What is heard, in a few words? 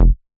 Squarehead Bass F